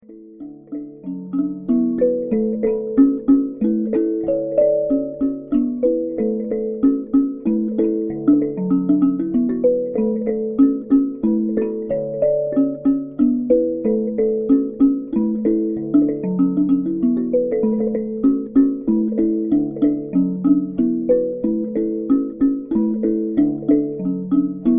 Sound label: Mbira; MbiraSanctuary